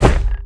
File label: dog footstep walk; npc; Half Life 2; Episode 1